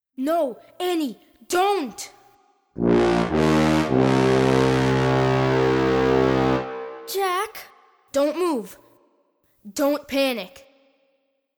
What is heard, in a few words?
Tuba Part One; Rehearsal Tracks